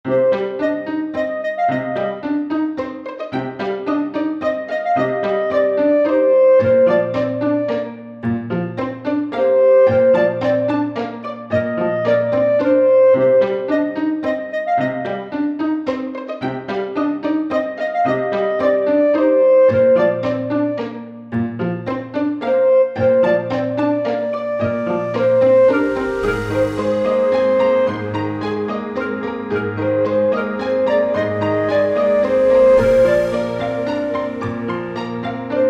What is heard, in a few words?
clarinet; Amber Forest